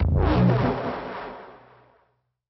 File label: win shockwave; Rabcat; Classic 243